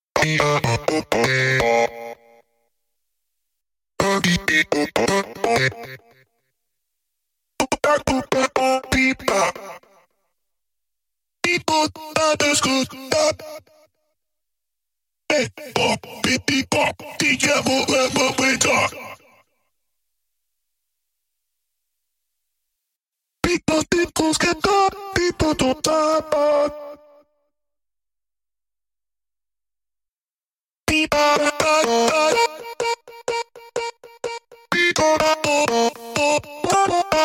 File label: Voices; fridaynightfunkin; dad erect